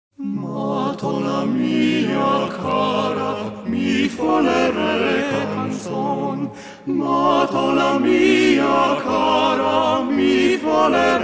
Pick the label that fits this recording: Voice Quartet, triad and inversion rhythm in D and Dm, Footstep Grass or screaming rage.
Voice Quartet